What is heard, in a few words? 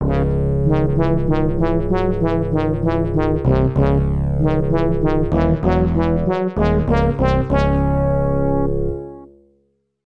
Defeat Fanfare; Nobunaga no Yabou Haouden; X68000